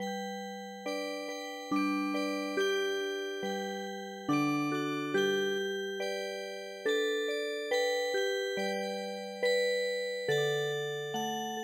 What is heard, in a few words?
Music Box, traditional